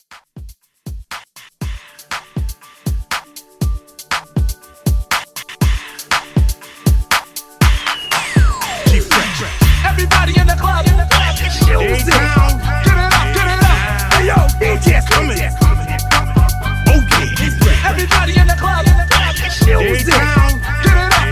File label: Dance Hype Re Drum 120 CLEAN